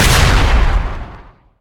mine exp, weapons

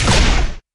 fireball fire, weapons

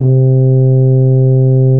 tuba loop note

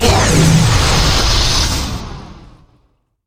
hookbomb impact, weapons

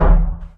grenade stick, weapons

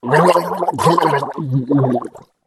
drown

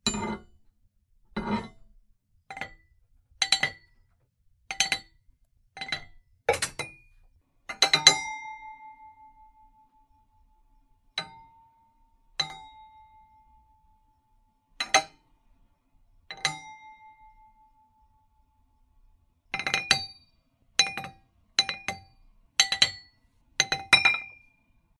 armor tests

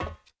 metal footstep

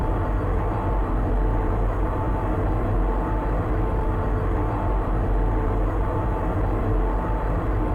raptor speed, vehicles

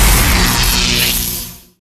electro impact combo, weapons

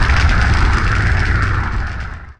fireball fly, weapons

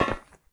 metal footstep

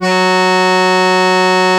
tuba loop note

